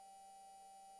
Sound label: mic, mars2020 edlcam